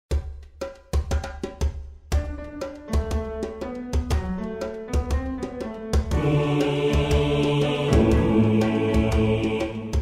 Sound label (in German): Bass; Sananina; Bass 2